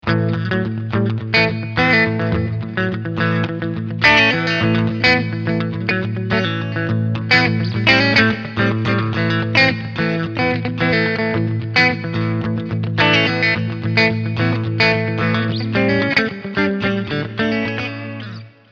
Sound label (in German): Beide PUs SC Modus Clean